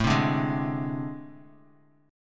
A Minor Major 7th Double Flat 5th, down, Bass Standard; strummed; JGuitar